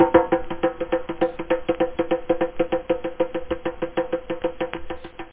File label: bongo; Workbench Add-On - Volume 1; NATURE